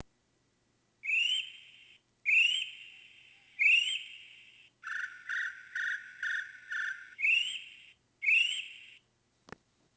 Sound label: kiwi sound